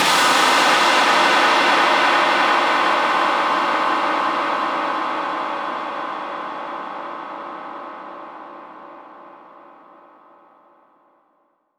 Downsweeps

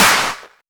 Clap; Vengeance Samples; VEC1 Claps

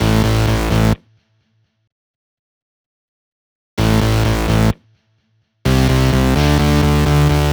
128BPM Melody; VFH1 128BPM Diesel Kit

